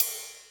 Ride; Equipped Music; Loungin House

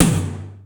W TOM 03, drums; East West - Global Sounds

